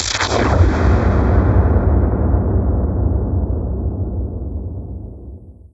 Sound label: city destroyed; m-intercept; android